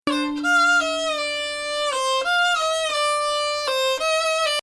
Bayati Samai Bayati Octave, inclusion of 7 within jins; Samai Bayati il-3ariyan; Bayati 8